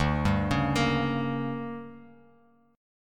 D-Major 7th Suspended 4th Sharp 5th, Bass Standard; DM7sus4#5; arpeggiated; JGuitar